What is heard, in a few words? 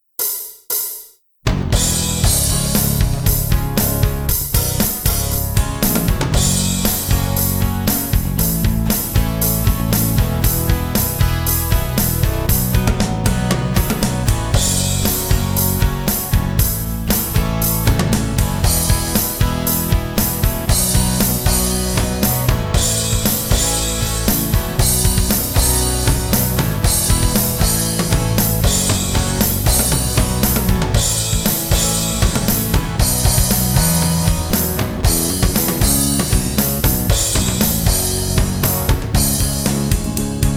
music guitars